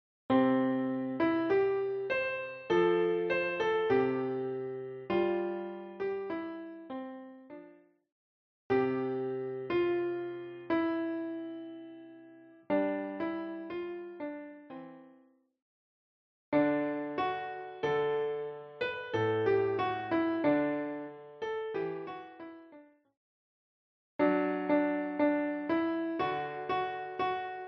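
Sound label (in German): Kinderlieder, piano; Kinderlieder Vol.II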